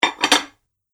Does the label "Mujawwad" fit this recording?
No